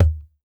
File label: WD L, DRM HITS; Spectrasonics; Liquid Grooves